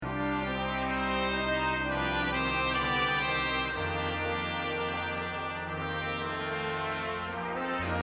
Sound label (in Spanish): Bajo